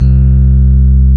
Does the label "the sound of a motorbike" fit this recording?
No